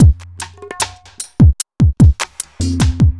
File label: evil beats 75